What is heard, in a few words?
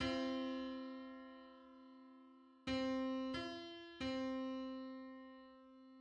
Six-hundred-sixty-third harmonic on C